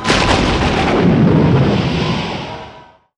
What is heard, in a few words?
explosion; Crash Bang Boom